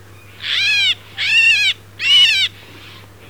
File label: distress call